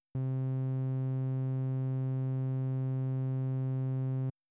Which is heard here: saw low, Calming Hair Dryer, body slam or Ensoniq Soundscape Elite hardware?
saw low